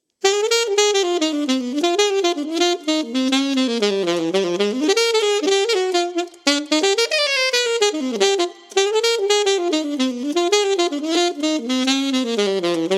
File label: Bop Alto Sax